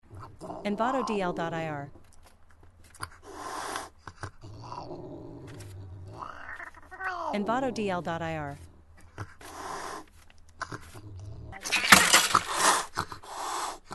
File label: Cat Angry Hissing; هیس گربه عصبانی; nature sounds